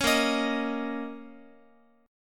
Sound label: Bb Minor Double Flat 5th Bb, Guitar; Bbmbb5; strummed; JGuitar